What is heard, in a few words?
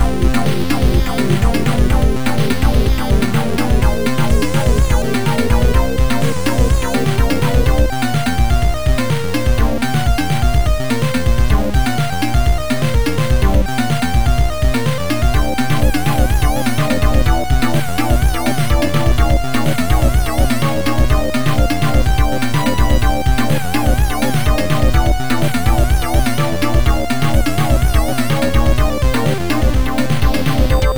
amiga level 5; bionic commando